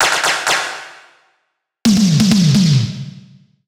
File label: 130 Beat Fill; Zero-G - Future Wave